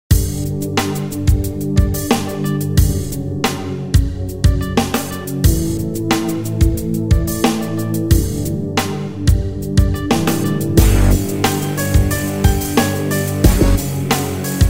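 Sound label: R&B Pop, no vocals; Soundzabound Volume 5 Disc 2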